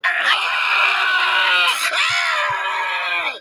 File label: screaming; sml junior